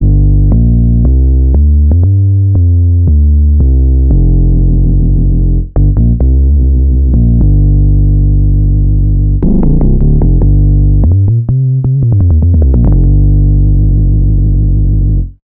Feedback Driven; Saurus

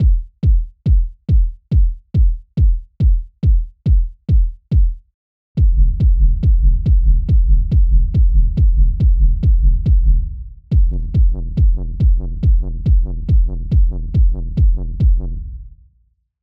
seaquake techno rumble; codwaves

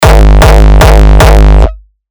kick hardstyle crunch

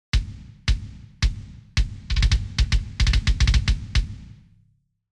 kicks; dani loeble impact; klangmacht

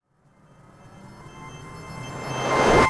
hard gamers alarm reverse; navigation button fx; nova sound

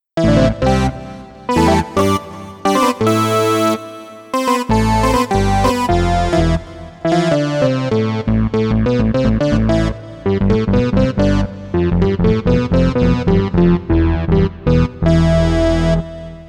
sine saw; the transmitter soundset

